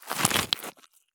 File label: stop gravel; Escape from Tarkov